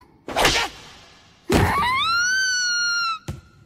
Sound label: scream; Love is war